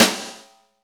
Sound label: snare, Roland